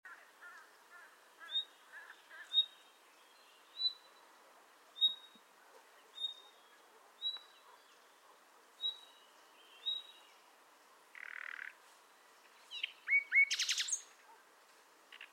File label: Luscinia megarhynchos; SETE